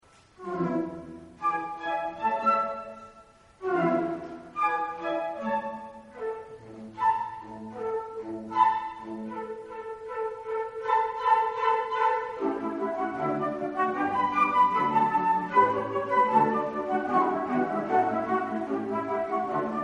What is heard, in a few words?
concert, flute; autumn concert 2019